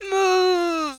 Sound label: female NPC death; server-nalc